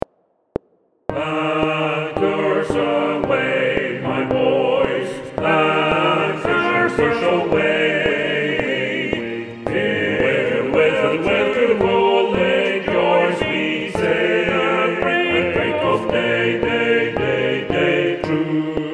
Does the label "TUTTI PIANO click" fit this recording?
Yes